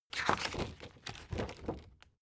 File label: spring binder open hard